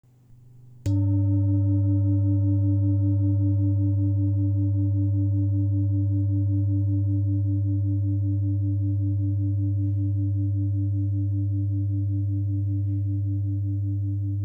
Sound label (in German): Mondschale